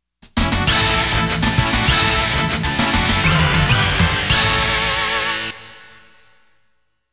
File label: Stage Clear; Konami Game Music Collection; Yippie!; Stage Clear BGM